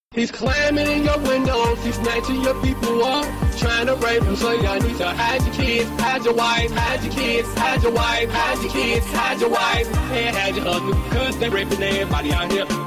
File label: song